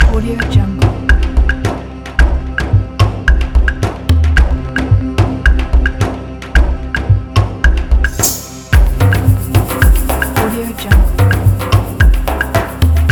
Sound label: middle eastern mystery dance